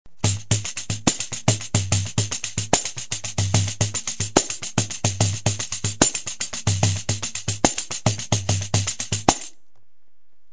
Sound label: Groove, pandeiro; rhythms; Christian Velasquez; Groove3